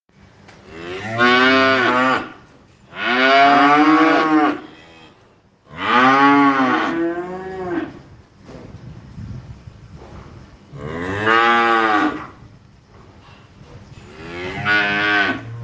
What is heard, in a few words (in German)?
Kühe; Modulkoffer